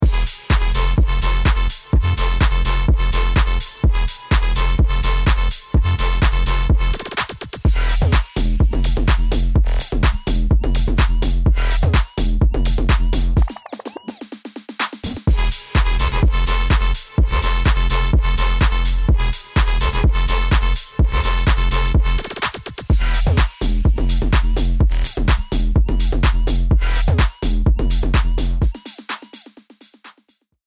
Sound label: bomb planted; music